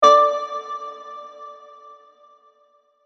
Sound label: game Won; Target Shooter Unity